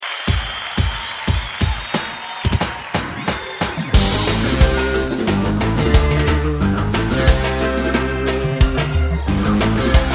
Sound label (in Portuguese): ao vivo em salvador